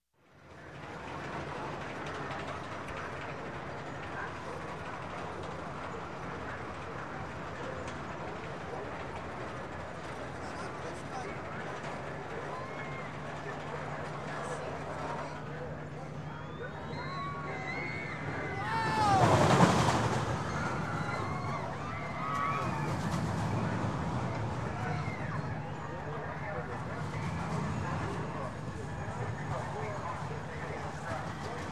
Roller Coaster, Small Roller Coaster Climbing; Pass By; Sound Ideas 2000 Series

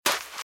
Footsteps Sand Dry Run Right; RadwinIsland

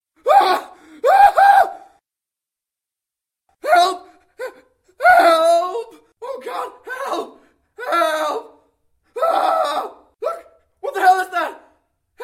scream; RadwinIsland

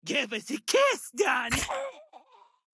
goon approuch; Blind Drive

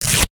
Index Card Tear; Office Supplies; 1001 Sound Effects

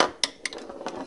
Pinball Hit Metal Gate

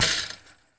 Street Ball Chain Rim, Street Basketball; SFX Kit

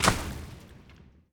Earth Enemy Footstep; AudioWizards

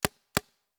Paintball Gun; Sound Ideas; Guns

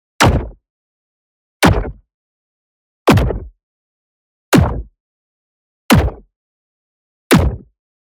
EXPLOSION SMALL Smash Transient; boom library; Matter

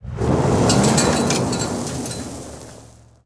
Auto Tires Peelout Gravel; Automobiles

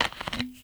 FOLEY Lid Removal Bottle; mono; Universal Sound FX

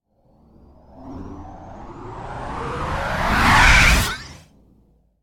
Space Ship By - Accelerating; Hollywood Edge; sound designer's toolkit v2

